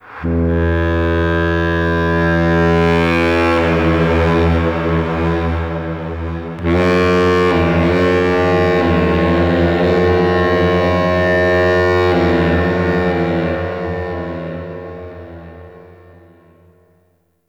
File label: Castle Distant Dark Horn; Ambience; Midrath Island